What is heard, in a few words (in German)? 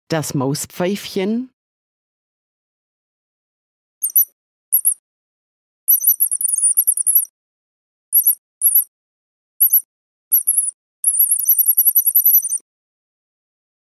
Das Mauspfeifchen